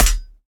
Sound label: trident hit; smite; poseidon